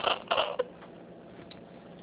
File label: First Squwak, Alexandrine Parakeet; Joplin